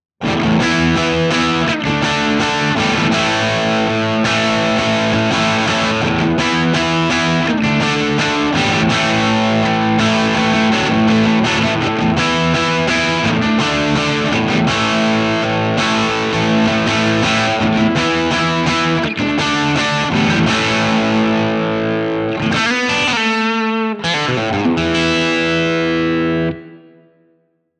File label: crunch; jensentone